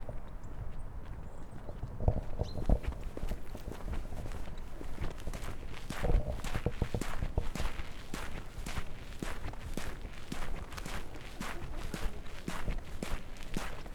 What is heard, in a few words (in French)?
Terrain de foot givre, asmr